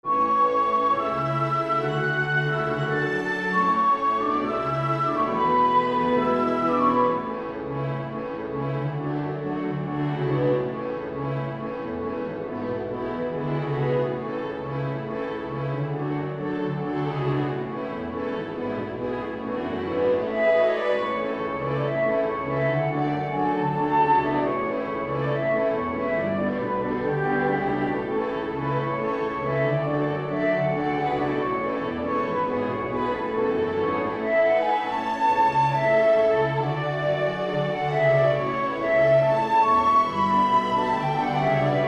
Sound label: solo flute